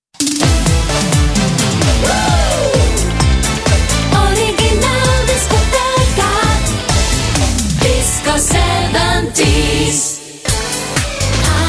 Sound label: disco 70s